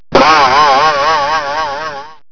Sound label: ARROW